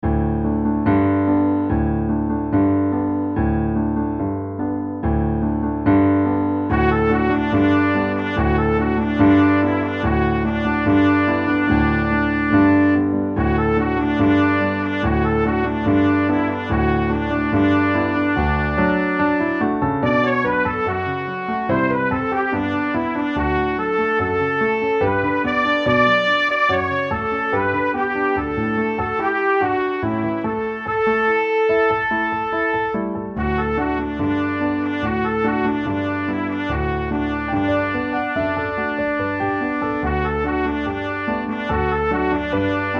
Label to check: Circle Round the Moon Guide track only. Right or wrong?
Right